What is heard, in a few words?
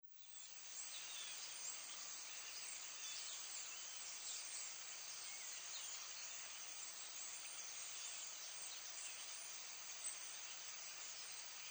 birds summer